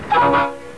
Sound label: Diesel; cvl